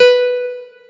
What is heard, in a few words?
piano